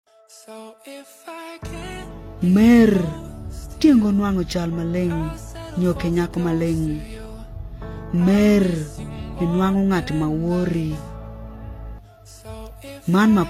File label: Love Ringtone